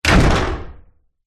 human body slam against metal surface

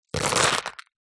foley stones many place into plastic dish; zapsplat

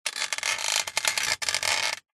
metal frame movement creak; foley

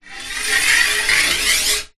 metal scrape pole slide; foley

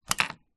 foley battery remove from plastic compartment

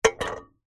cans soda 200ml 2x empty set down on concrete

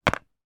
game scrabble game letter tile put on board

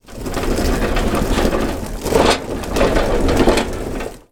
lawnmower push roll motor off path

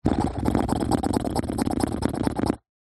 human lip flicking fingers

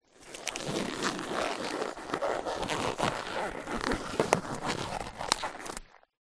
latex glove put on hand; foley

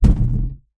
foley balloon inflated impact hit surface vibrate; zapsplat

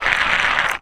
household flashlight metal roll ground; zapsplat

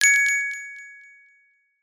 app alert tone

